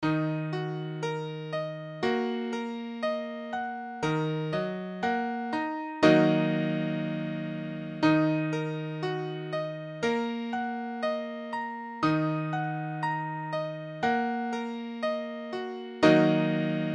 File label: Ebm, piano